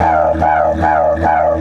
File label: 150, DIDGE RIFFS